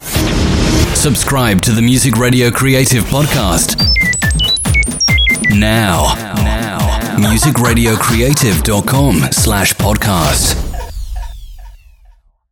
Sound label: radio jingle; hirp app